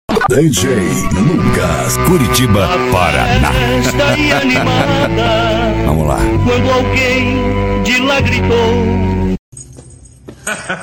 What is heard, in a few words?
mega funk, eletro funk